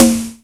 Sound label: Snare, Roland JX-305